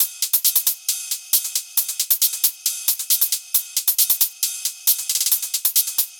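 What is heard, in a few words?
drum hihat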